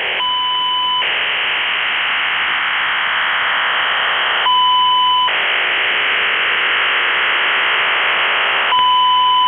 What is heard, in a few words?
OFDM 48 42